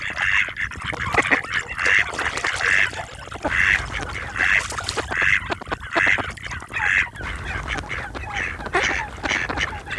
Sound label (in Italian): germano, birdsound